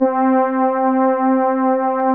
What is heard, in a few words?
FM Elmo Pad; 90s Sample CDs; Club-50 - Foundations Roland; FM Syns